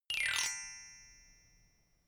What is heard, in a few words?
notification glockenspiel slide down soft